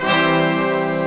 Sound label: fanfare